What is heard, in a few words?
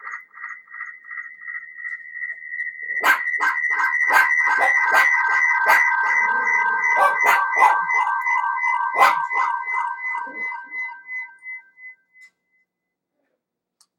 testing with fft sound